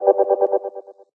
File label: whoosh longer chopper